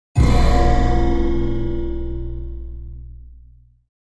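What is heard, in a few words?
Hit Lfe Metallic Clang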